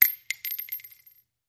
Shell Case Sngle Tube, shell casing movement

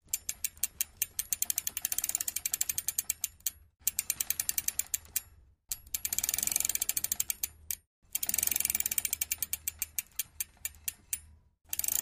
Bicycle Gears Spinnin

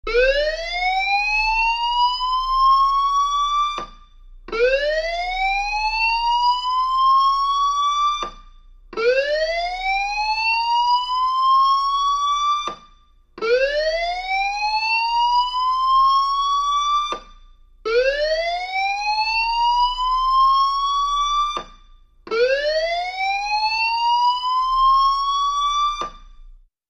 Alarm Fire Building

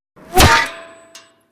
Bullet with Whir Impa, metal

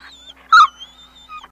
Dog Whimpering Sml Ba

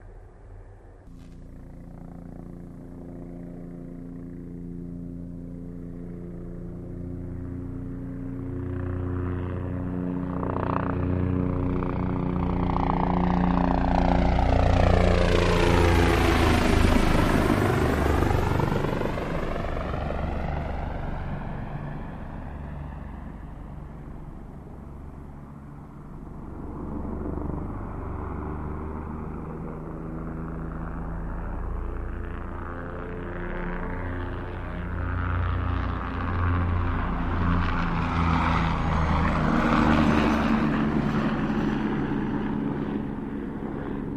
Apache Right Left Med, AH-64 Apache

